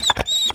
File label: injured out, breath; reviver